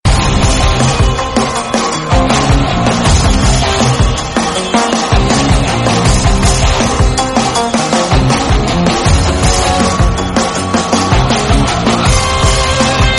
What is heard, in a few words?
Metal Rock Ringtone